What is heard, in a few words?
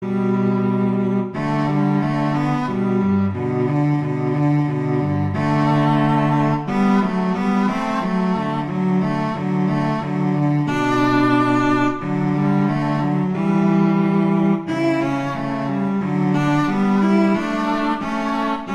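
Duet, horn; Mozart; Duets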